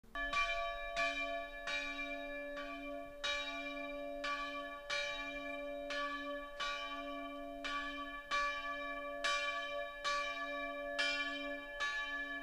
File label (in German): Glocken